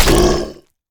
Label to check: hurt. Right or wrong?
Right